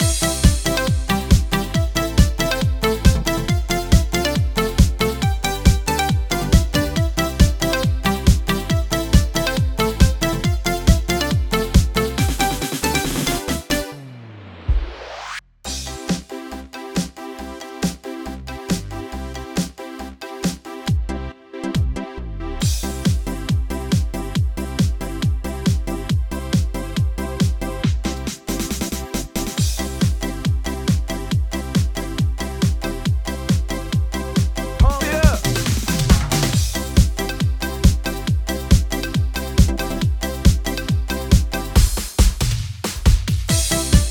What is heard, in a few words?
Dance